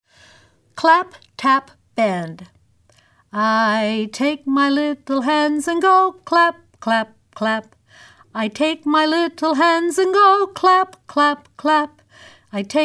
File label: Clap Tap Bend